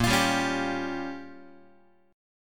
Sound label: Bb Minor 6th, Guitar; x,6,8,5,5,6; strummed; 4A,4E,5A,5D,5G#,6C#; chord shape